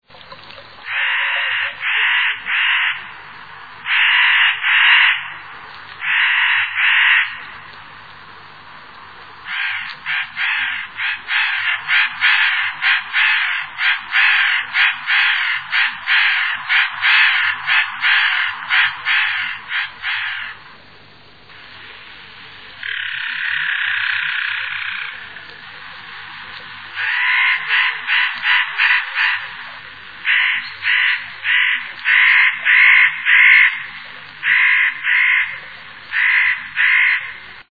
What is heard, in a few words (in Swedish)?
notkraka